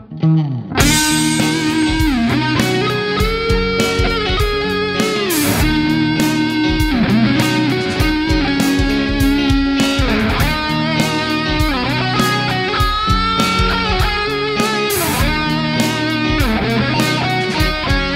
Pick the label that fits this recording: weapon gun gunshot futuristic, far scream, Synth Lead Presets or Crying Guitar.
Crying Guitar